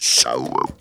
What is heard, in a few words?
Funky Vocals; USB Soundscan vol.18; AKAI